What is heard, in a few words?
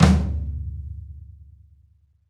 Rock Flam Tom, Roland BK-5